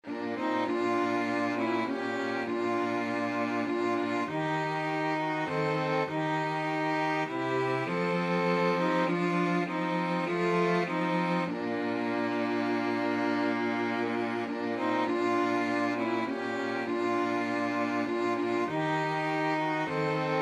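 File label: rugged cross strings, 2 violins cello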